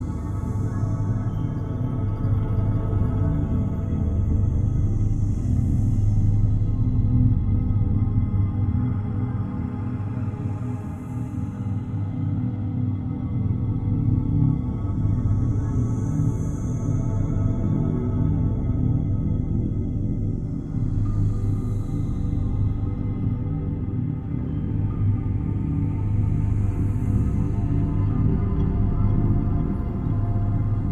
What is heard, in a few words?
Underwater Drone